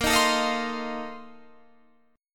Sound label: A sharp Major 7th Suspended 2nd Suspended 4th A sharp Guitar Standard; 18,18,19,20,18,20; A#M7sus2sus4 strummed; capo=3